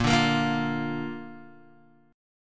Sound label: A sharp Major Guitar DADGAD